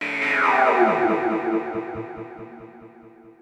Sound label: KEY SHOT TEKNO 140 A; Techno2; Singleshots